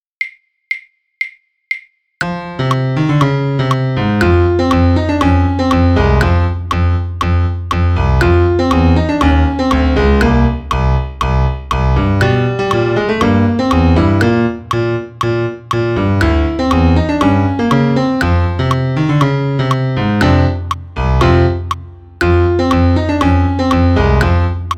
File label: piano click; tango